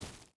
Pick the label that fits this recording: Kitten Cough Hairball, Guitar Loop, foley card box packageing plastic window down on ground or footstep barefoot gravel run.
footstep barefoot gravel run